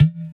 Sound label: EQ Perc, Percussion Mix